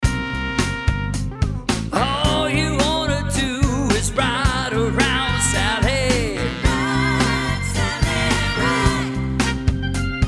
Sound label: raspy vocal